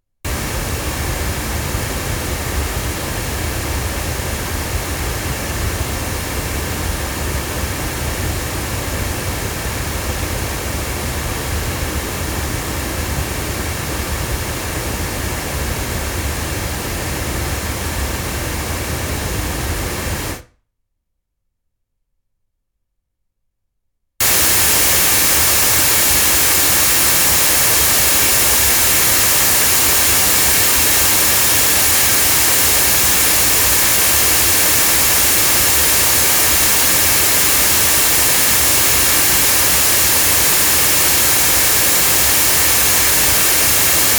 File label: loudspeakers; SM6i 8; LineAudio